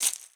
MARACAS; Xsample VOL-9

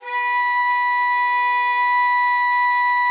BON DI, Winds, Old World Instruments; E-MU Producer Series Vol. 7 – Old World Instruments

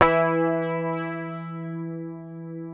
PICKED, Bass, E3; Sampleheads; Will Lee Bass Library

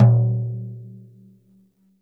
floor tom - snares off; JazzFunkKit

